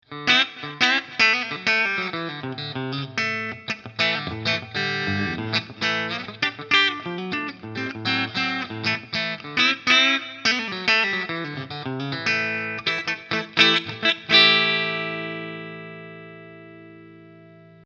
Custom 24 Steg SC clean; PRS SE; Stealth Quilt LTD

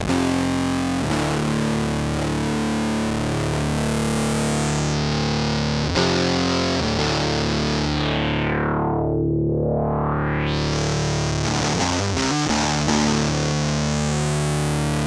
Filter; Ottobit

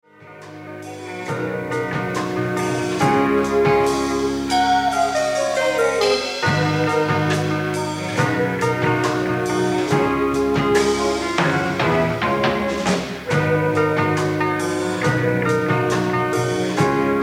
Wurlitzer 200A Intro live Cafe; Cafe Schalotte; 1981

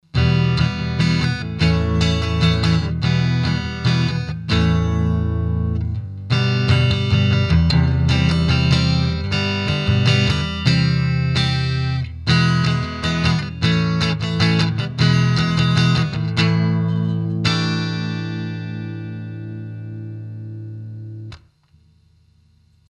Telefunken M82 E Gtr clean Kick off Boost on

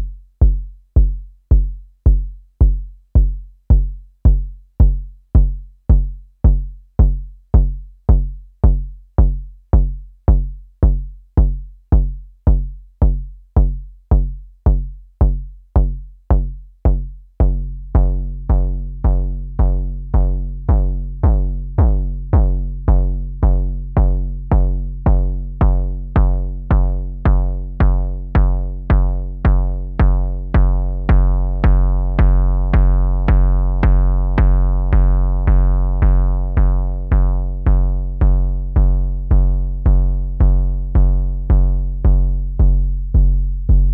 Mandrake Bass Drum Sounds

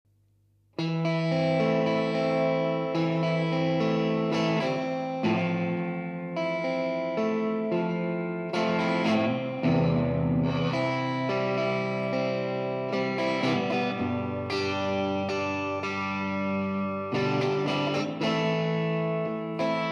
SX Strat 2 Mic VOX

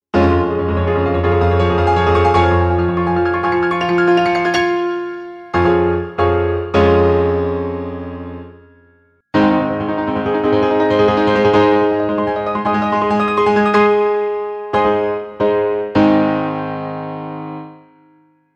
m3 piano klassik

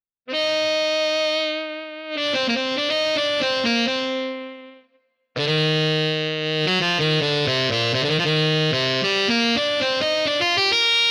Yamaha YDS 150 Tenor Overdrive

